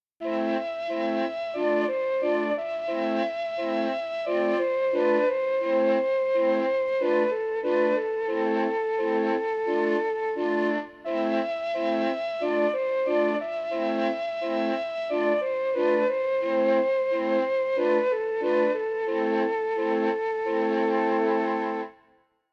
Mellotron Flute

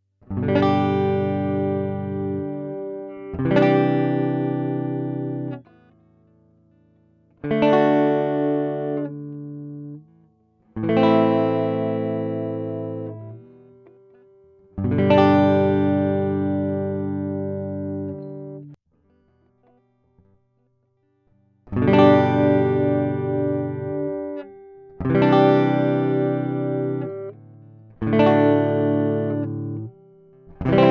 Preamp Test DI RNP ISA TB12

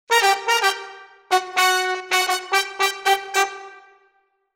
horns

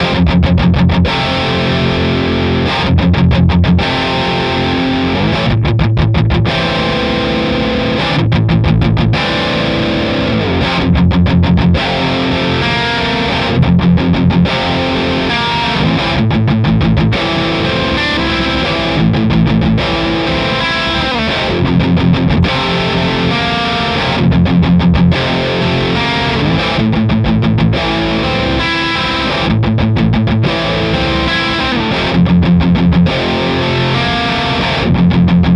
Pro Mod High Gain Bridge; Charvel Joe Duplantier

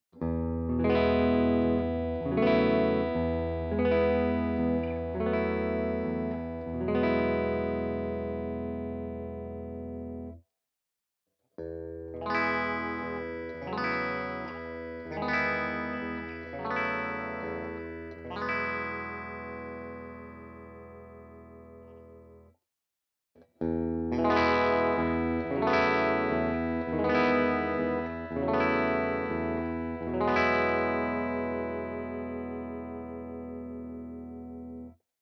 Guild clean chords all positions

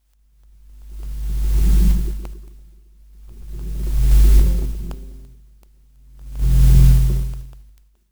Line Noise; Presonus io44

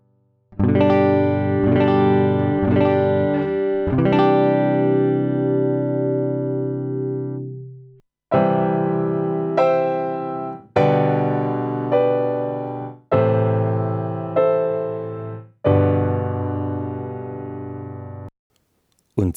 UA Apollo 1176

